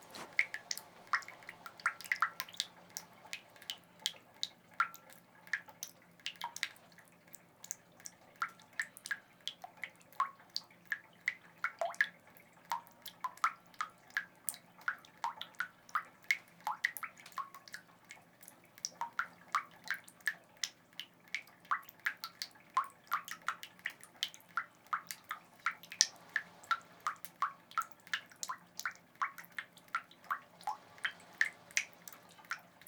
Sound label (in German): tropfender Wasserhahn